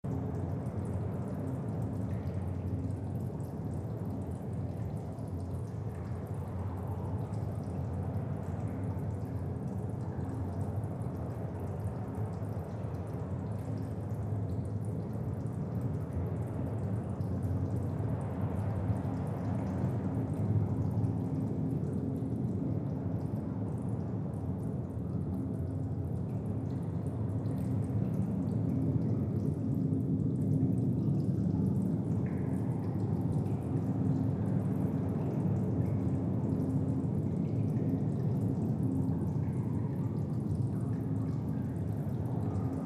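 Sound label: Ambience Tunnel Resonant Wet Loop; the unfinished swan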